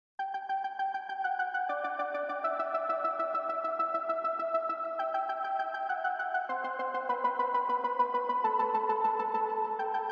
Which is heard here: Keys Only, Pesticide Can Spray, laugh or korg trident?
Keys Only